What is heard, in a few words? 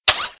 Target Rise; Playable Tutorial Level